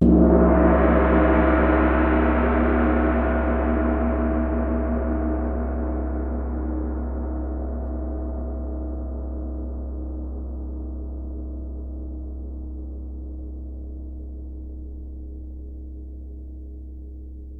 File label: GONG BIG; Miroslav Vitous - Percussion & Harp